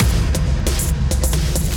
To Battle Fastest Transition; Pokémon Trading Card Game Live

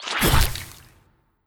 Plant Throw

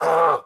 Prisoner Damage

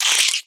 Flycaller Prepare; Folk Hero

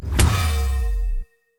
Dark Punch; DarkPunch rr4

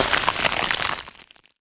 Ice Spell Freeze Frost

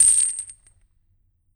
Spectral Chains Hit; Damned

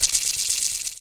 Rattle Snake

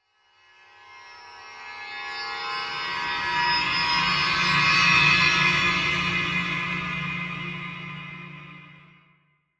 Intro Amb China

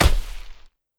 Sting Attack

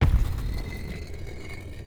Door Open

